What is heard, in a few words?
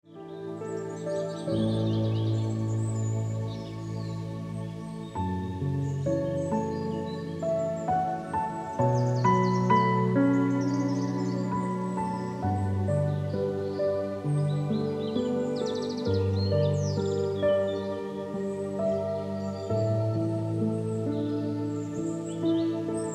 Lofty Hills Ringtone Piano Music